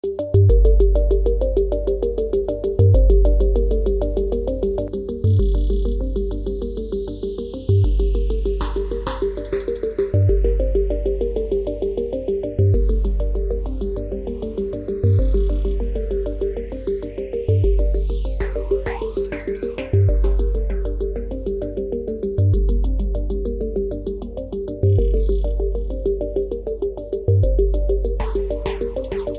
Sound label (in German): Komplexes Musiksignal; Behringer Dual Phase